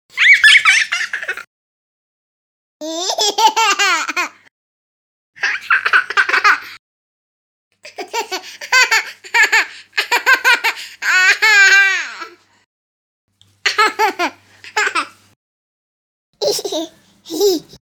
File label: child ha ha; افکت صوتی